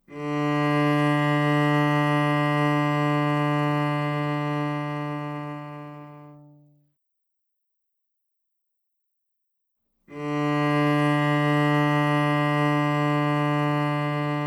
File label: Tuning note D, cello